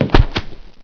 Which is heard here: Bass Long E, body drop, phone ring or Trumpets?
body drop